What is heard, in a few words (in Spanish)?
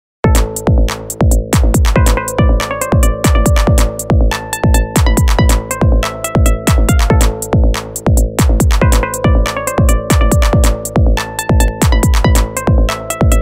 piano